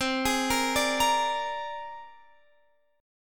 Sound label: C 7th Suspended 2nd Sharp 5th, C, down, Guitar, Drop D